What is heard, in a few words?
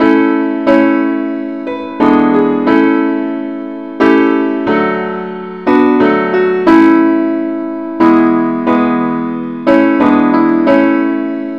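simple accompaniment, key A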